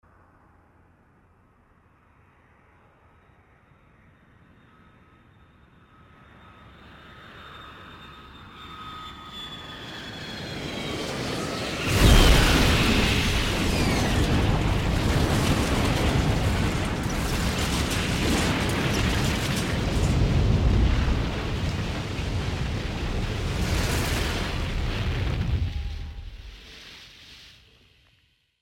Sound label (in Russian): padenie samoleta